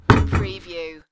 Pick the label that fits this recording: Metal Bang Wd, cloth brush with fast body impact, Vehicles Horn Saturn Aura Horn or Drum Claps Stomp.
Metal Bang Wd